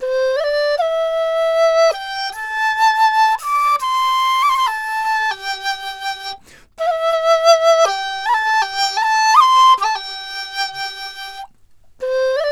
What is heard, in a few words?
DIZU, 90; INIS - Opium